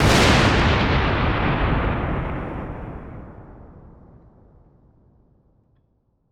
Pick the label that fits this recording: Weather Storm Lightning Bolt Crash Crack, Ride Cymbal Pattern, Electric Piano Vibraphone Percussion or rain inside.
Weather Storm Lightning Bolt Crash Crack